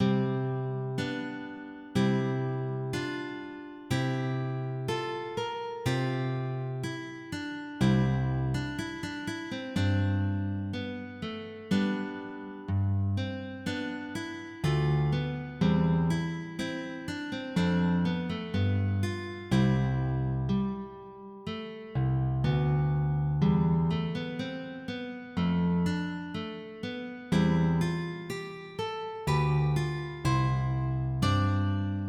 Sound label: Toccata